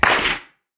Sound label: whiz big; impact; midnight guns